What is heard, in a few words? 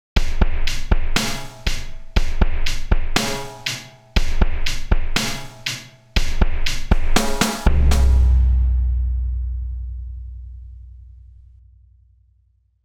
drums